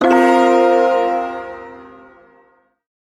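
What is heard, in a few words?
Shawzin Pentatonic Minor Chord; Warframe; Duviri Ersatz Shawzin; Chords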